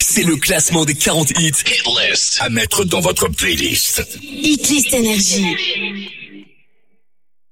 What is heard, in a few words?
Acap; NRJ